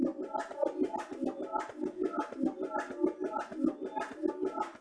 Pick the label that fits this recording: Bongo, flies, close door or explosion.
Bongo